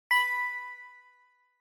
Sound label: Quiz Button Single